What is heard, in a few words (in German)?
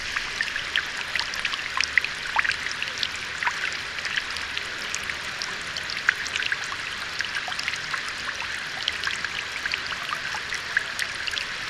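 Plätscher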